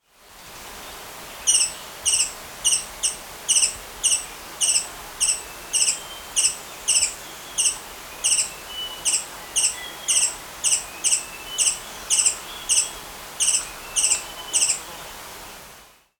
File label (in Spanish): Colibri de raqueta peruano Ocreatus Peruanus